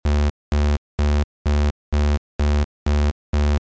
saw tube

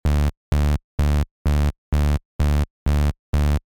saw drive

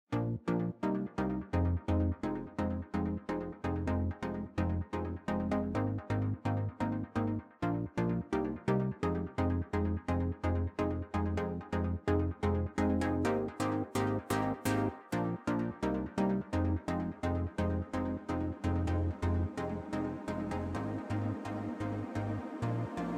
pluck

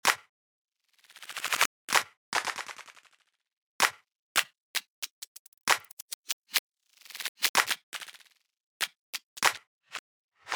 pitchhack; Ableton Live; Creative Extensions

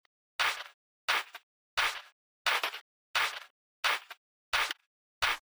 regroover snear high

